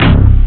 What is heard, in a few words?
Hit Bass; rare gems